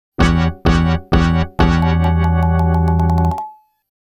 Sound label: Failure